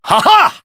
ha ha

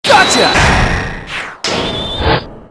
percieve; apollo

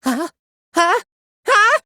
huh huh huh

